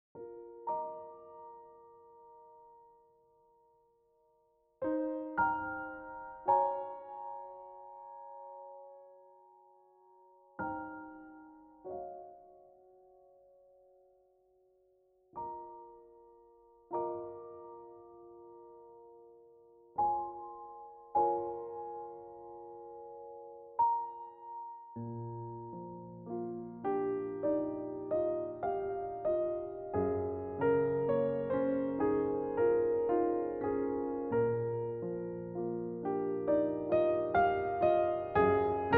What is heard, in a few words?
morning Waking